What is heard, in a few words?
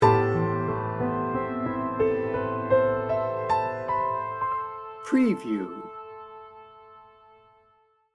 romantic passion sting bumper